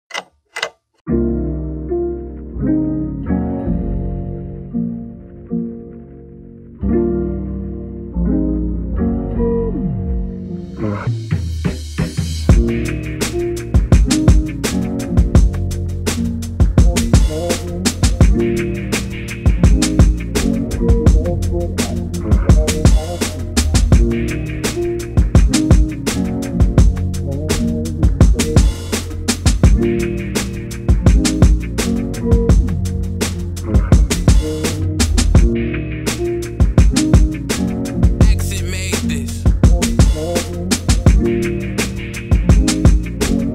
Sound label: type beat morning, instrumental